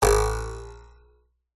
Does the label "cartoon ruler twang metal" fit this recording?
Yes